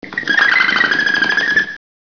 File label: animal runs, jungle; gearbox